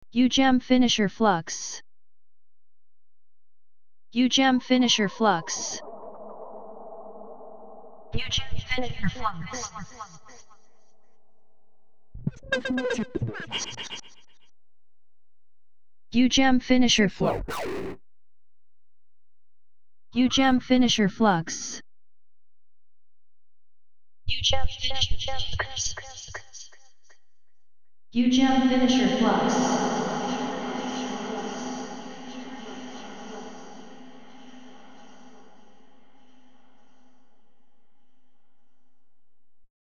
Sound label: Vox; UJAM FINISHER FLUXX